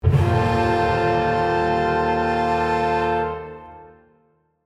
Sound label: Brass open